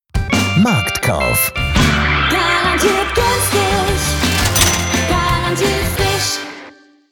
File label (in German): Gesangsjingle; werbesprecherin; Marktkauf